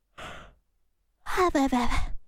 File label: Yawn